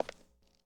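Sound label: Foot Concrete Shoes Walk; Lucius III; pc linux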